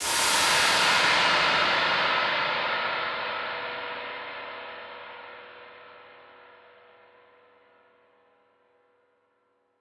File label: Noise Verb Decays; stereo toolkit samples